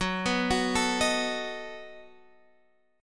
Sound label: B 7th F sharp